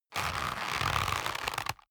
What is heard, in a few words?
leather creak medium